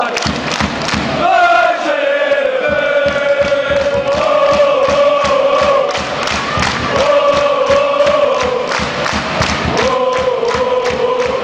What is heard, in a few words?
wij zijn heerenveen fanchants